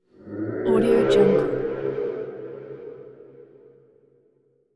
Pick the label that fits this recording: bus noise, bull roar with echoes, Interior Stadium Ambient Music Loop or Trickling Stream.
bull roar with echoes